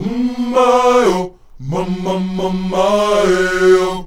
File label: UM MAY, Afro Chants, Um May-O; Roland LCDP11 Africa VOL-1